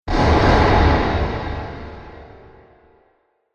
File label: Fantasy Explosive Impact; Miscellaneous Impact; Andrew Fleming